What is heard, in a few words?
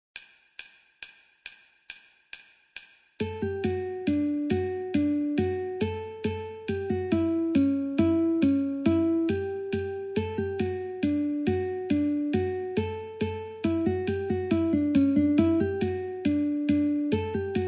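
Guitar metronome 69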